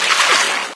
wade, footsteps, infected, walk; player; source engine; left 4 dead 2

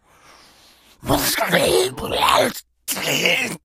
shout, infected

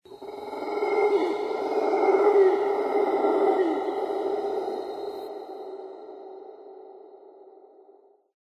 animal call yeti, lair; Source Engine; tf

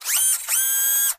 item robot arm pickup

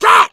hunter shred, attack voice

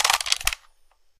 rifle clip in; weapons; rifle ak47; source engine; left4dead2

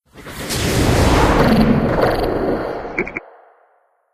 advisor attack; ambient; outro; source engine; episodic

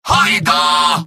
medic battlecry; mvm

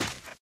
gravel, footsteps, walk

